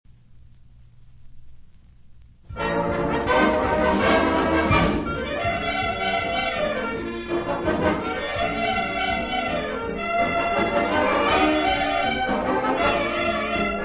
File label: Clarinets Allegro, Band